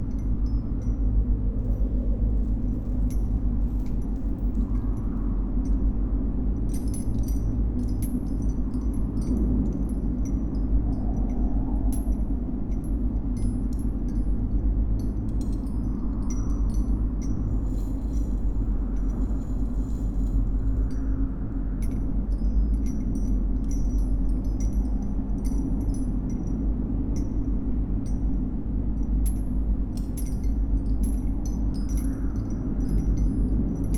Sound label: Ambient; Prince of Persia Warrior Within